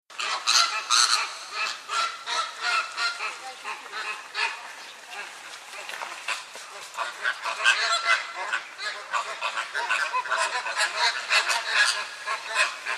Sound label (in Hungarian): rozsas flamingo Phoenicopterus roseus